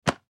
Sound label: Soldier Hands Place At Side Stand At Attention; Military; Warfare; Miscellaneous Combat; Blastwave